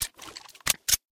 reload; STALZONE

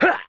military hit; STALZONE; customnpcs; stalker

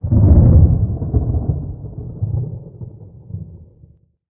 Small ambient pre storm; STALZONE

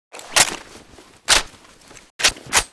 groza reload; STALZONE

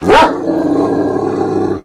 dog attack; STALZONE

